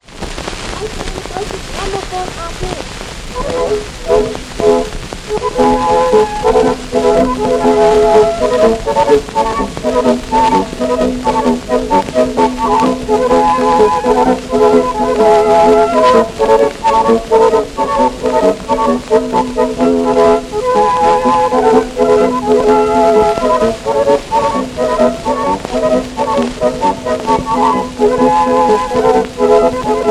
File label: Polka; Rolkappchen